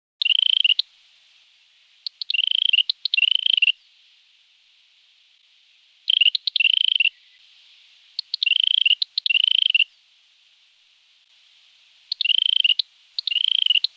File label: red Capped Robin, robins; canberra garden birds